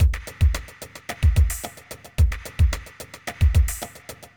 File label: r n b kit 110h